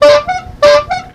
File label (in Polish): trabka